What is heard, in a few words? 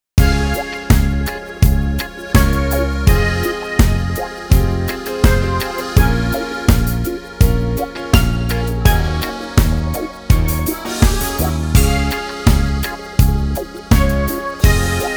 Sun Reggae